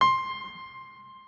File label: Piano c5